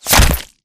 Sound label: bullet generic head kill shot human; Titanfall 2